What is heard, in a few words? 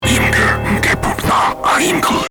message